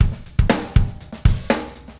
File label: Funk 120bpm; FL Studio